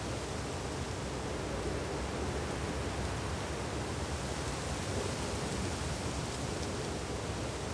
wind trees ambience; Star Wars Obi Wan; XBOX

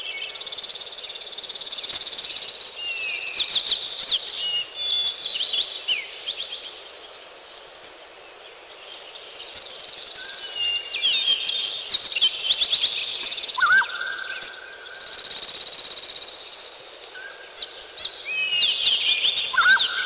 Amb Jungle; Bruce Lee Quest Of The Dragon; XBOX

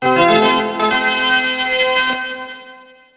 organ; WhiteOut; XBOX; tundra

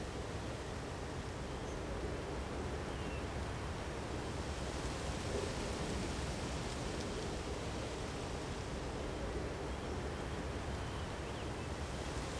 swamp forest, ambience; Star Wars Obi Wan; XBOX

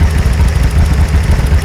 LOW, Engine; Cars; XBOX; MATER

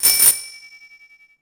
bell, Cars; XBOX

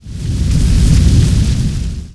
Flame; Finding Nemo; XBOX

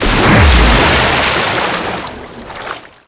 bigsplash; water; Tony Hawk's Project 8; XBOX

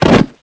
body fallen; shipcharge; Pirates Of The Caribbean

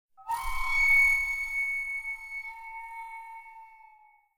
MUSIC pipe; Myst III Exile

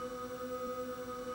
reel spin, slots

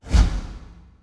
whoosh

